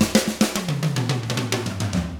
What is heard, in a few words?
110 SAMBA, Drums; ILIO; Double Platinum Drums 1